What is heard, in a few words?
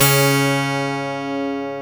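12 string electric acoustic guitar D2; AKAI S6000 CD-ROM - Volume 1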